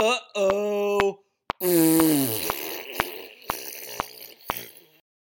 fartz

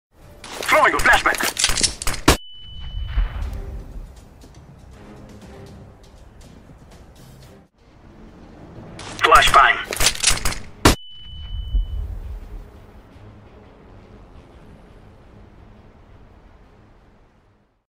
flash bang sound; counter strike global offensive

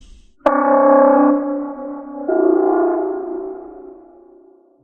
cyberpunk dystopian police syren sound

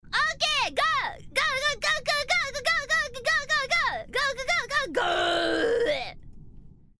polka gooo

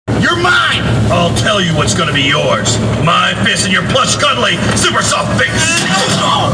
punch

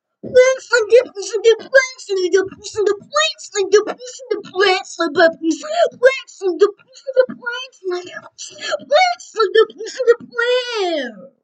beatbox boy

